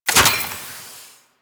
Laser Aim Rifle Reload, Weapons; Grineer

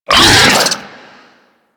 Infested Ancient Pain, Enemies; Warframe

